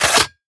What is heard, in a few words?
reload, weapons; cstrike; valorant; prime vandal